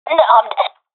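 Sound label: death, professional female; vo; agents; Source Model Packs; Counter Strike Model Pack; CS2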